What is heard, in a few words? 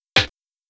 Snare, Snare Patterns; Beat Components